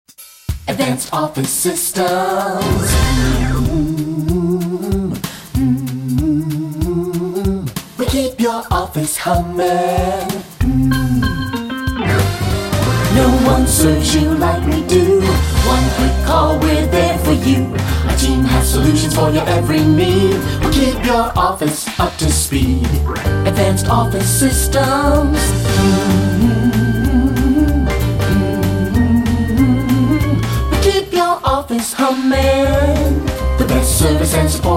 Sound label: Jingle; Advanced Office Systems